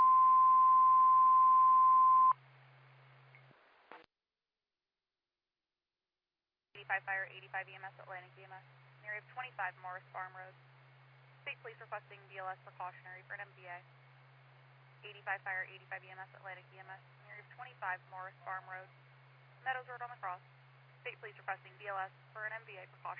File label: Siren; Lafayette FD